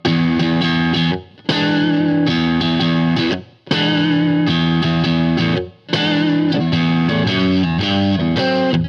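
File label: Blues Guitar Intro; Digidesign; Pro Tools Essential Loops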